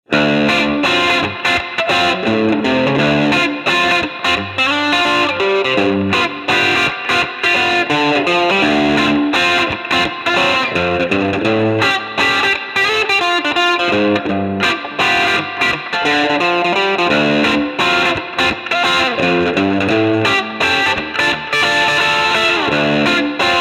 Strat Bridge TS-9

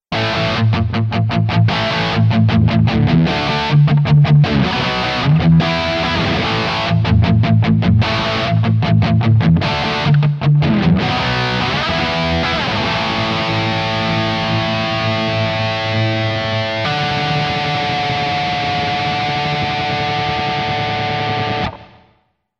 Epiphone Les Paul 50s Bridge Highgain Rhythm

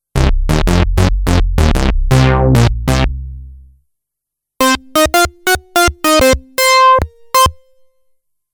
funky trance lead

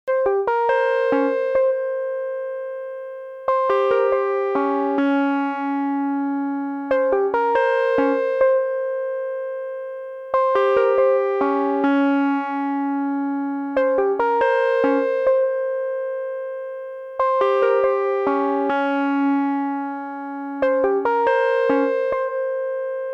DnB Chase Piano

